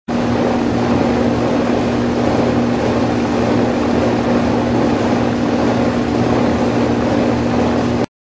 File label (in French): pompe à chaleur normal